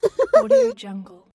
silly laugh

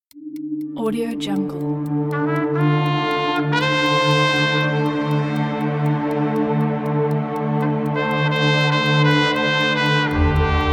atmospheric melody trumpet logo

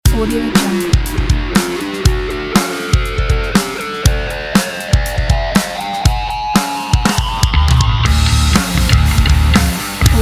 extreme sports nu metal pack; antarctic

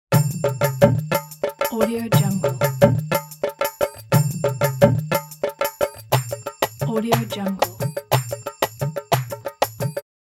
indian percussion

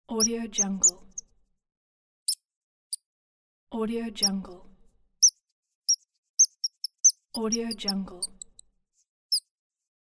mouse squeak

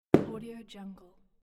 book close

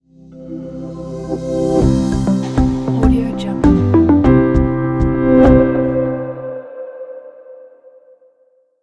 corporate upbeat intro reveal logo

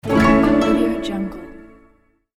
game win

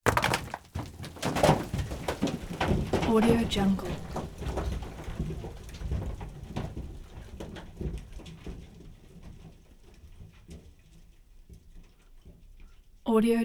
rocks drop down mine shaft; prosoundfx

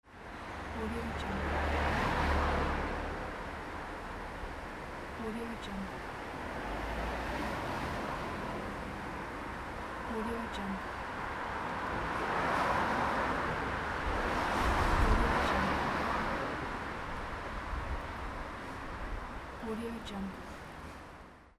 city noise background

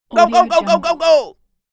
cartoon peasant applauding shout

